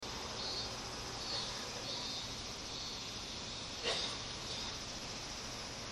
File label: car